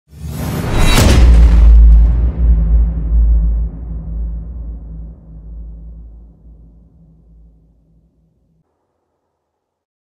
Impact

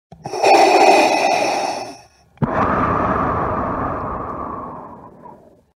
breathing; darth vader

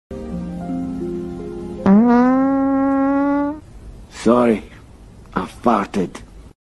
Sorry I farted

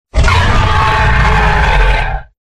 monster roar

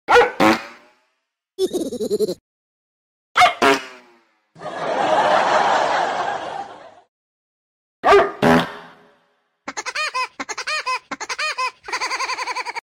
bark fart; Meme Sound Effect

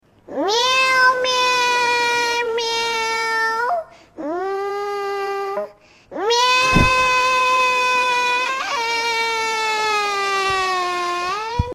Kitten Singing

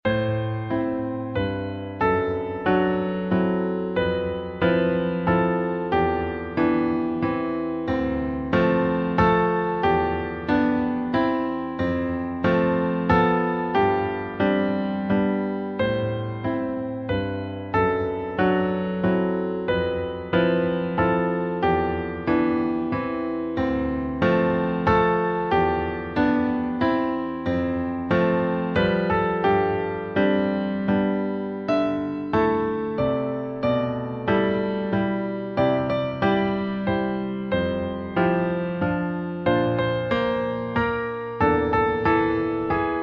dulzura piano; made with Voicemod

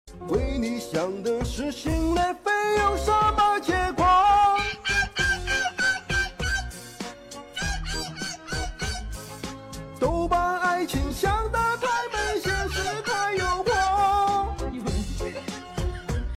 Chinese man and dog singing

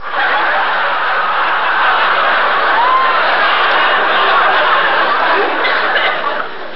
crowd laughing; made with Voicemod

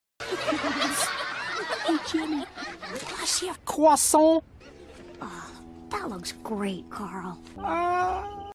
moaning; carl wheezer

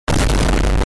vine boom bassed boosted short; made with Voicemod

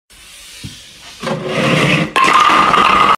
papers falling on the floor sound effect; doctor

